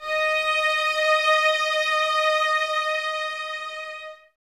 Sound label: violas D#5, String Ensembles; Miroslav Vitous; VLS 1+2